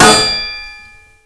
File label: hit metal